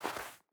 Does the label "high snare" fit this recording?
No